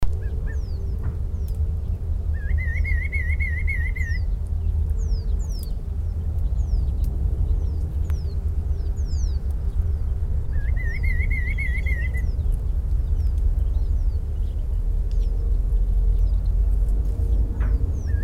parrotbill reed Paradoxornis heudei

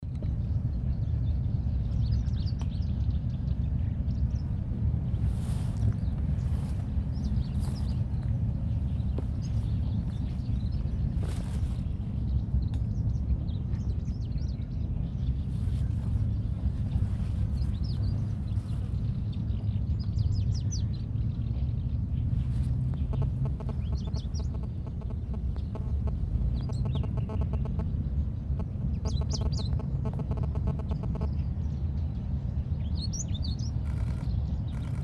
warbler alstrom Seicercus soror; Craig Brelsford

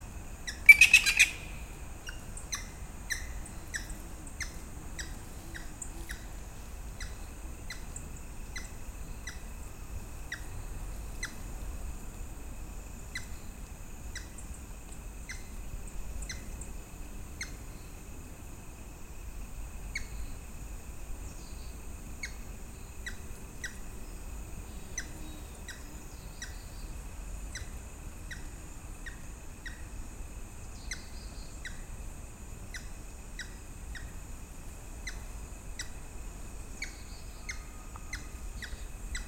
woodpecker grey-headed Picus canus

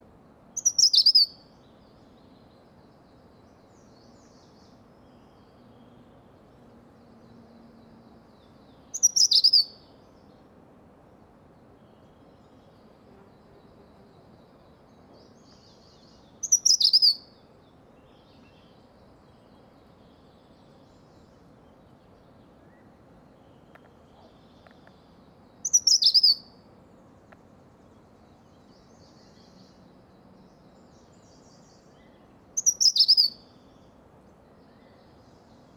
tesia chestnut headed Cettia castaneocoronata

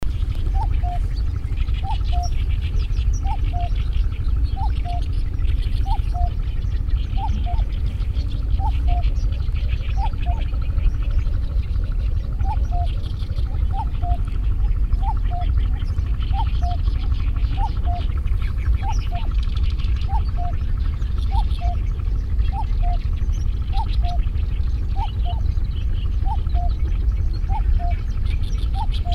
cuckoo common Cuculus canorus

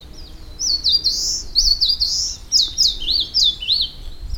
warbler eastern crowned Phylloscopus coronatus

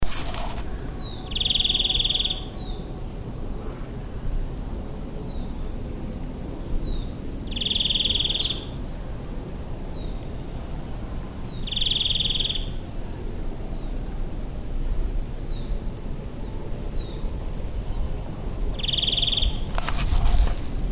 nuthatch chestnut-vented Sitta nagaensis

